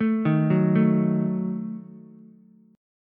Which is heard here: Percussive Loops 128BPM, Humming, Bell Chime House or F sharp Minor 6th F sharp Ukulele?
F sharp Minor 6th F sharp Ukulele